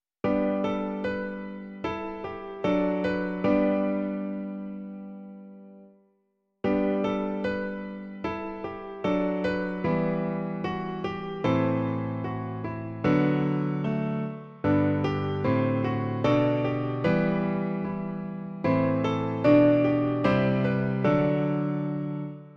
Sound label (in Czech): klavír, Klavírní verze